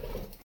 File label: jump; fowl-play